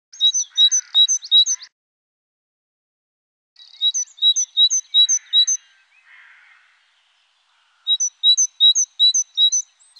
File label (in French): Mesange charbonniere; Le cri de la mésange charbonnière